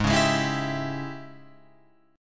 G sharp Major 7th Suspended 4th Sharp 5th, Guitar, Open F; JGuitar